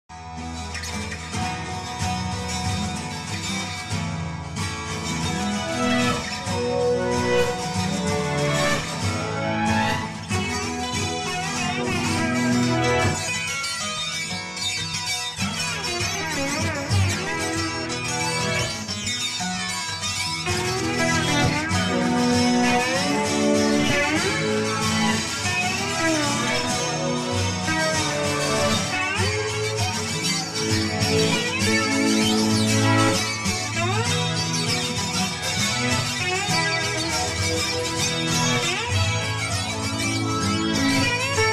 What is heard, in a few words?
guitars